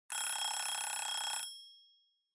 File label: Kitchen Timer Alarm Mid